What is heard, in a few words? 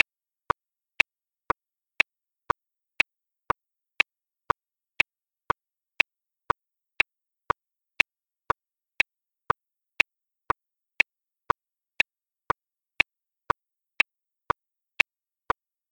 TicToc